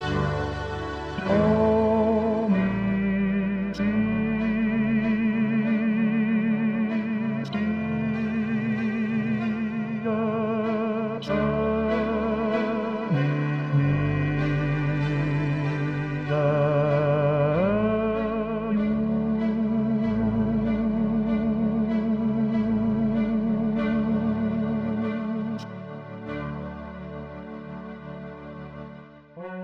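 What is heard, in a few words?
Requiem Offertory Soprano; Faure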